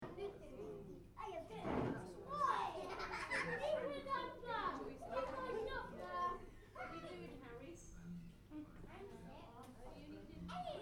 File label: Party, Children, Small: Lunch Ambience; Cafeteria Sound Effects; Cafeterias (Sound Effects:Backgrounds); Interior kids party lunch; Stuart Duffield